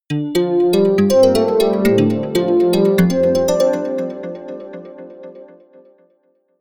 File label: ringtone melody